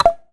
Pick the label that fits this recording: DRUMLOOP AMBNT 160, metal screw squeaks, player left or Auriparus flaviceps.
player left